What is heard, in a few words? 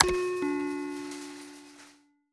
pop Button Press Back; game audio